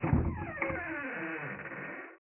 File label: Door Creak; Learn to Fly 2